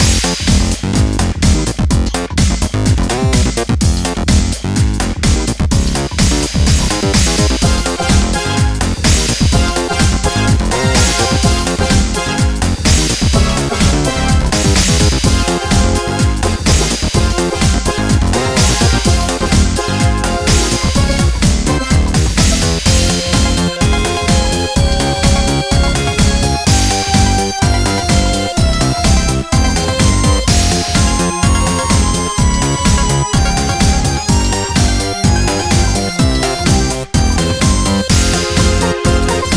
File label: Deep Sea Bass (Coral Riff Remix); crypt of the necrodancer